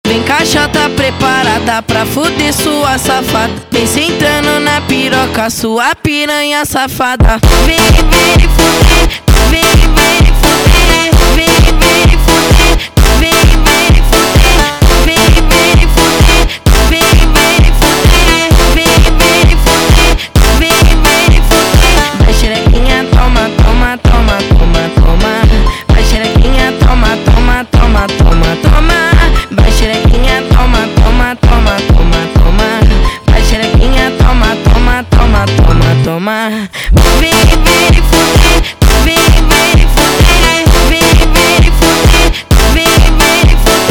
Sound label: phonk